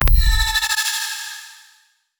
Glitch Transition

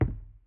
Footstep

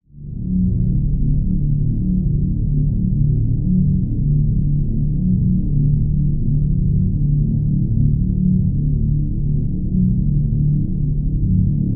Drone; Drones

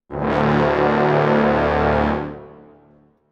Dramatic Brass

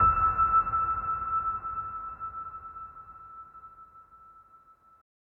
Piano Reverb High Ultra

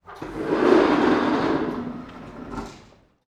Open Door Sliding Door; Household

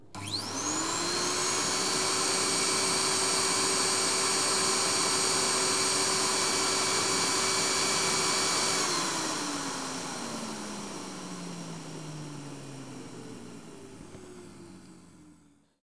Regular Vacuum; Household

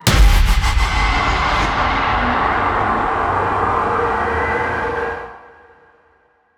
Tension Builder Impact

